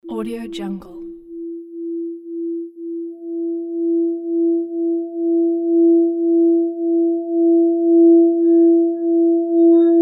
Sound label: 672 hertz shiva singing bowl throat chakra meditation